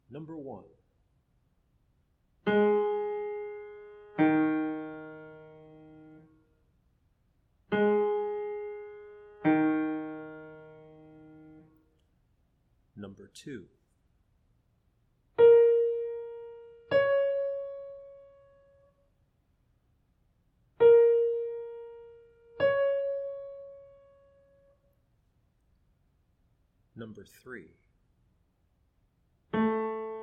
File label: intervals